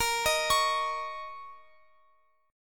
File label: Bb Minor Double Flat 5th Bb, Guitar, Open F; Bbmbb5 arpeggiated